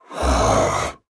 Nonverbal Sigh; Ornn

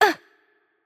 Hurt Light, ro RO; VO; bloom minigame

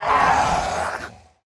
Enemy Death; bloom minigame

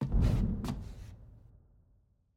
Puzzle Arm Exit

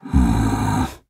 Nonverbal Annoyed; Ornn

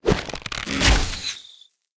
minigame SFX enemy attack basic cast; bloom minigame

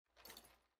Foley Buckle

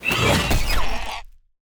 minigame SFX enemy spawn; bloom minigame

